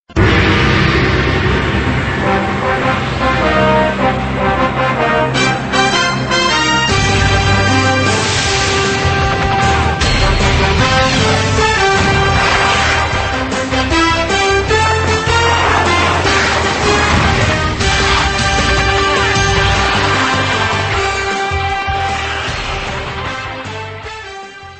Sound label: ringtone; hockey night in canada